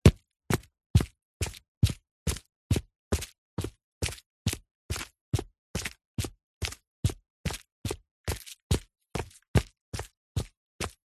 foley footsteps sneakers trainers walking on gritty concrete